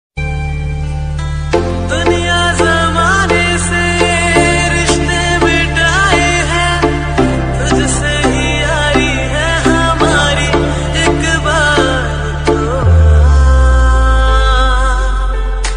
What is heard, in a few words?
Dj remix hindi ringtone